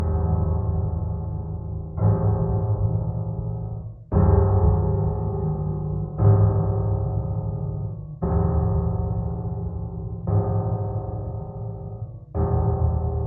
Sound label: ambient dread